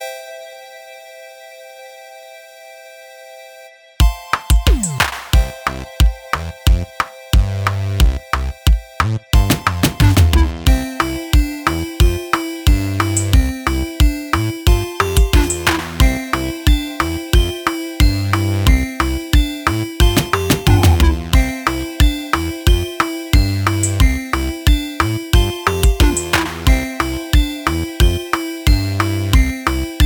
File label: 8-Bit March